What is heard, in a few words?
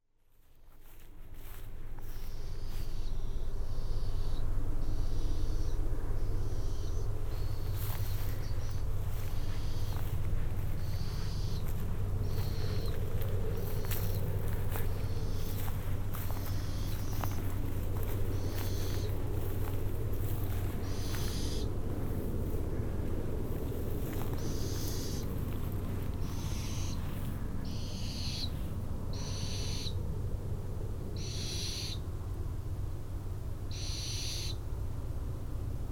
barn owl